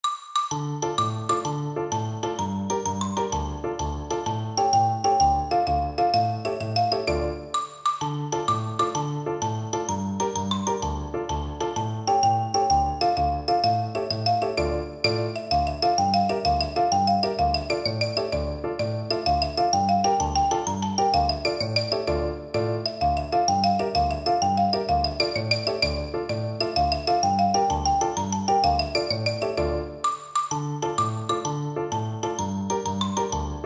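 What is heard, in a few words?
tarantella, xylophone; percussion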